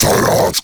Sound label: Cast Vocal; Warframe; Uriel; Remedium